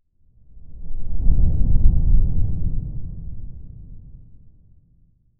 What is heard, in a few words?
make a very short high fr, animation